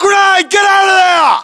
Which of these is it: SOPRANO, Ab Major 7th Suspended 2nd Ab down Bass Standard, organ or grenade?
grenade